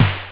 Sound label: boost, drums snare; OctaMED SoundStudio V1